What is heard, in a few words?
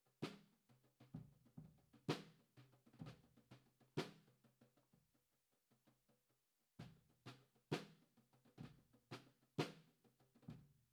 hi hat, 96bpm